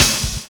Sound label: DOUBLE BD; Univers Sons